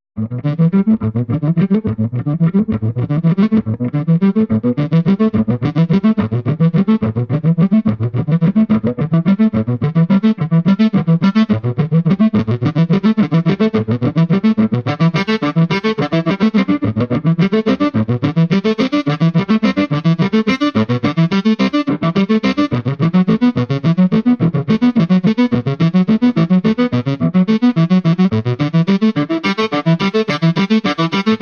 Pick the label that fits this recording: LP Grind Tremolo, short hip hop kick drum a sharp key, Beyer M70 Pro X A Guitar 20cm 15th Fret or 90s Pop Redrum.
LP Grind Tremolo